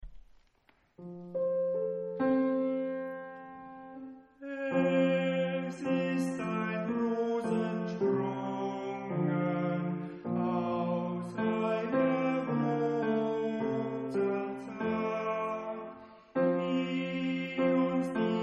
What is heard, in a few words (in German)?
Es ist ein Ros entsprungen tenor